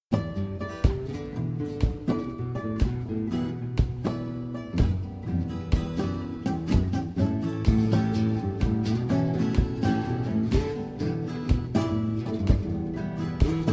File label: TRIO blues